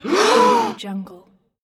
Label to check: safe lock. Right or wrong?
Wrong